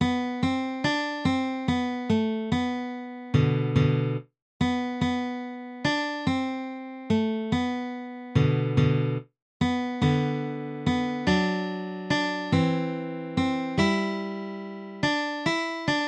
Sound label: guitar